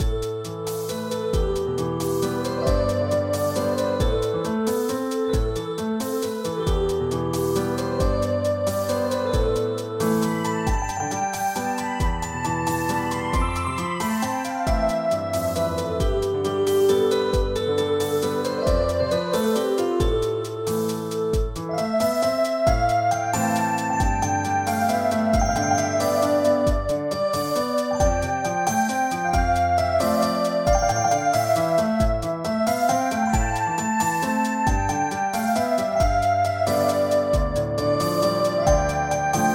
keyboard

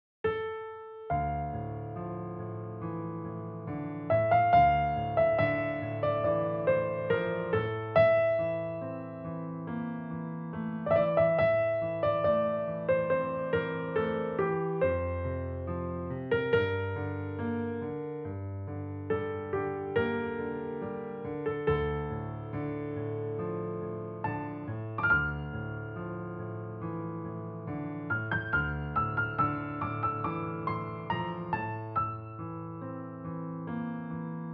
piano

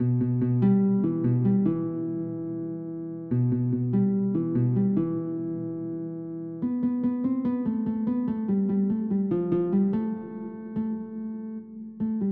santoor

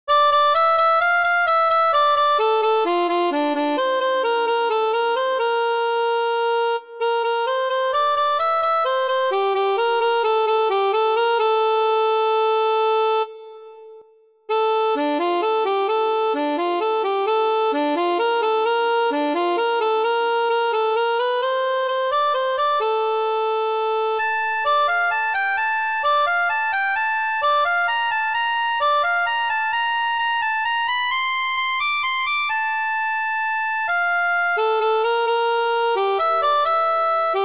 melodica

